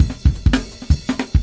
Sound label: 168 bpm natural jungle, drum loop